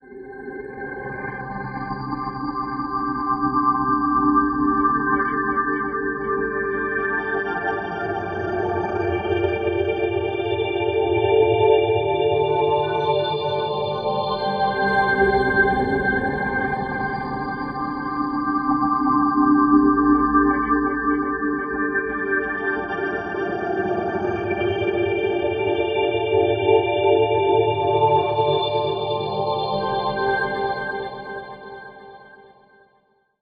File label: texture grain clouds C Pent, One Shot Textures; Transmission-X